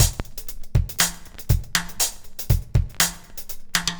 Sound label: stickside, Drum loops Boombap